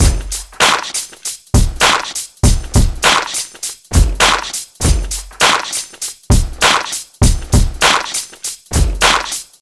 100 bpm drum loop g key, Free drum loop - kick tuned to the G note